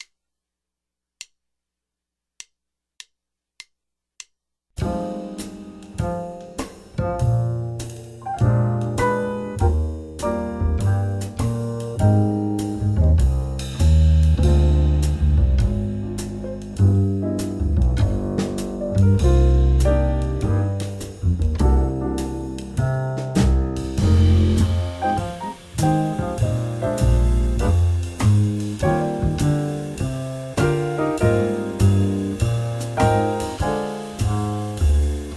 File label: (Cm) 8 Bars Loop